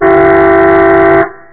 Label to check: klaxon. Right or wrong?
Right